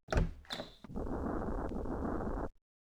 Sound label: doors